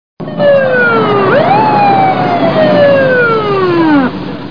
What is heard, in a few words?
siren; Hey Beer Man